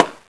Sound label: safe; Spider-man Cartoon Maker; brushes